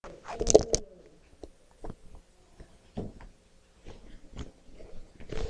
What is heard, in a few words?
meow